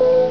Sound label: in word